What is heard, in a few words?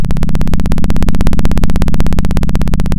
Sound of a plane taking off